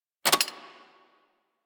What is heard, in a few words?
Mech Release, Weapons; Tenno; AK Bolto Prime